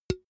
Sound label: empty can hit damped soft